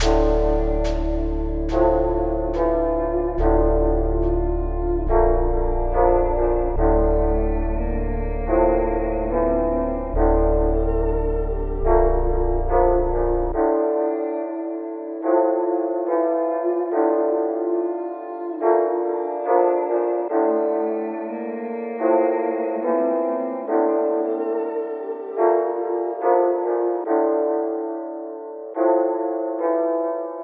142 BPM D Min, Melody Loops - Drill; Angels

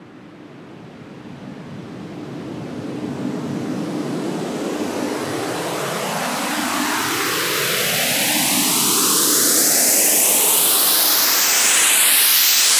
Riser

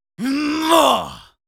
Yells, Viking Vocal

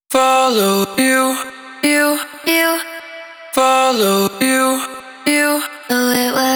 Vocal Chop - 140 BPM C Maj; Cymatics; Euphoria Vocal Sample Pack

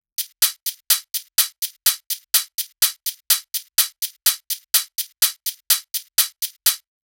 Bass House Kit, 125 bpm Hihats; EDM Drums; Drum Kits; Stems; Ghosthack; Drum Mega Bundle; Ultimate EDM Drums; Bass House Kit 10

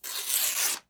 Tearing Paper Out; Fairlight Sound Lib

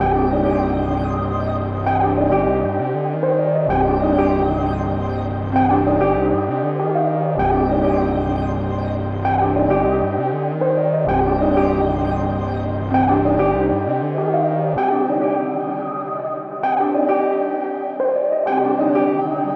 130 BPM C Min; Cymatics; Zodiac III Beta Pack; Zodiac III Production Suite

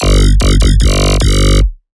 Dirty Bass G 150; One-Shots; Bass Hits (Dirty); Ghosthack; Color Bass Essentials

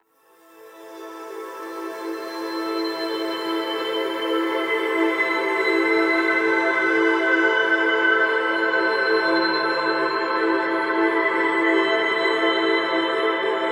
Synth Pad - A 90BPM; STEMS; Ghosthack; SkyRealm; Mood Kits; Mood Kit 02 - Afterlife

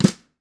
Birch Brush, snare; Drums; Ghosthack - Free Snares 2023

